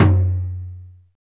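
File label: percussion surdo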